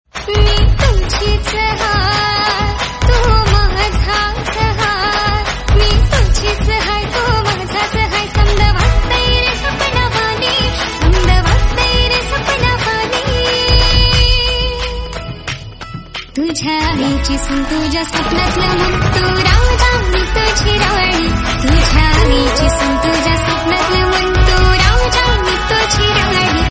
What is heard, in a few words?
female version